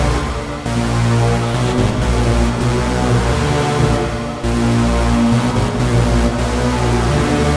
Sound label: Proto Techno pluck 127 A#; synth loops